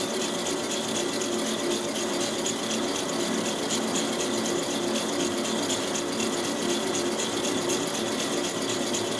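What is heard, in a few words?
ambience computer broken; Star Wars Knights Of The Old Republic II; XBOX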